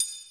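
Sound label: Triangle close, Yamaha SY22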